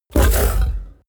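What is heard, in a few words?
coloss snort deep short, creatures; kreaturen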